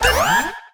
Timer; SE Puy26 Timer; gamerip

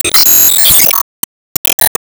Change Bumps

